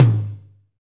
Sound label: tom, Real Kit